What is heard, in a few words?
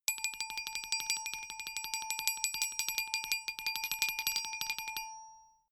glockenspiel tremolo low; percussion